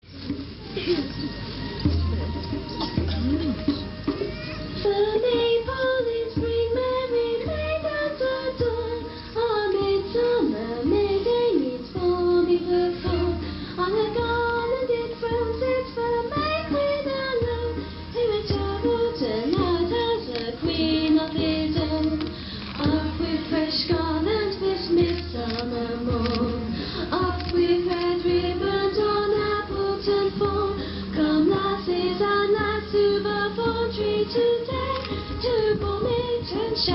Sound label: Bawming Song